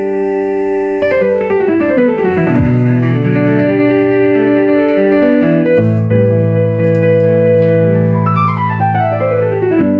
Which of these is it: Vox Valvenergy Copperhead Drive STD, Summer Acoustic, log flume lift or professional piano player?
professional piano player